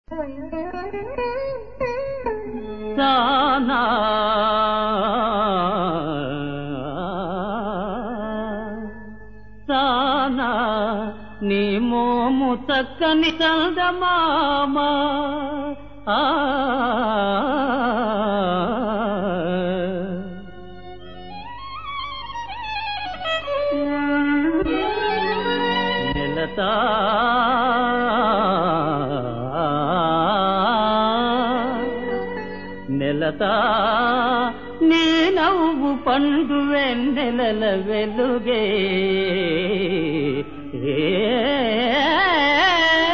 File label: Padyam; SriKrishnaMaya